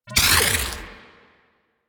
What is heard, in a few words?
Corruption Deposit Start; Warframe; Missions; Zariman